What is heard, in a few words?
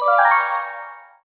test pass